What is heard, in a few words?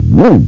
bass slide; Meeting Pearls Vol. IV; GTI - Schatztruhe; bomb